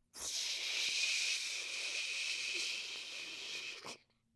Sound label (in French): eau haut débit; Liquide; Robinet; mouth-fishing